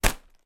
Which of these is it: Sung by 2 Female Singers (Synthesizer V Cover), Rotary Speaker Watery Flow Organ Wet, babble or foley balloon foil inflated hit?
foley balloon foil inflated hit